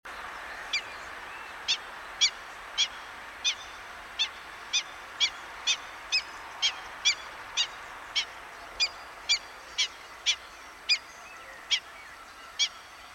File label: Black-winged Stilt